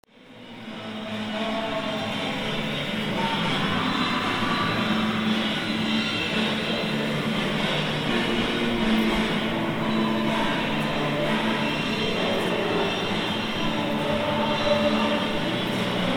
Barrio Recoleta, Centro Cultural Recoleta; caba

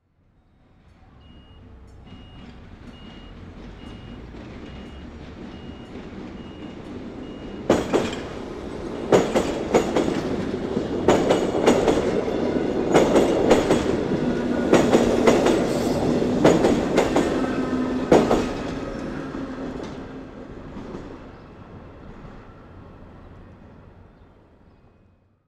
trenes paso a nivel calle; caba; mendoza; tba

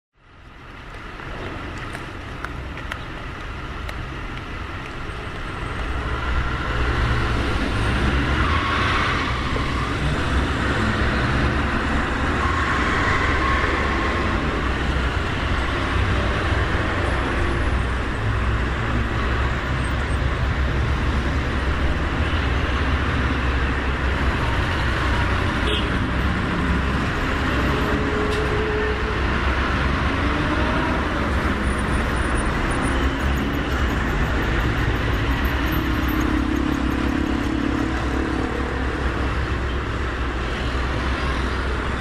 calles; republica de siria y matorras

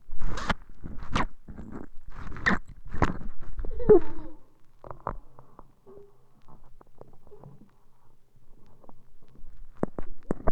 toma mate; Oído Absoluto

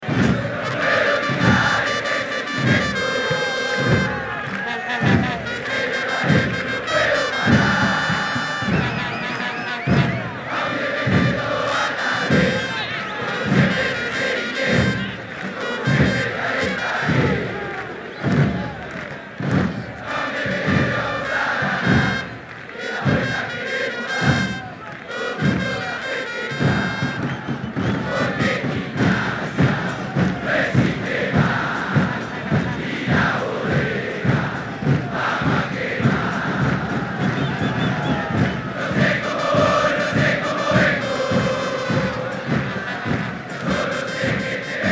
futbol, ciudad de mendoza; independiente rivadavia

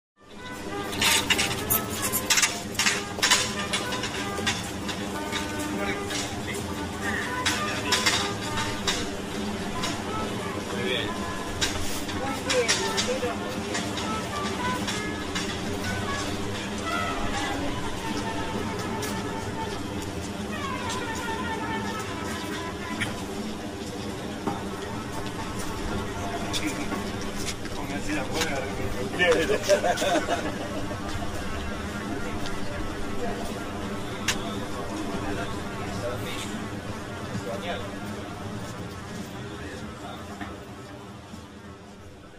calles san martin y san juan; Rosario

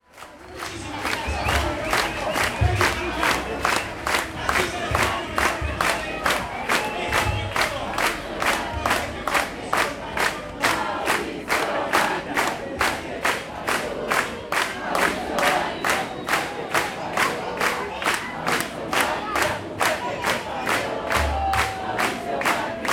carnaval casa uruguaya

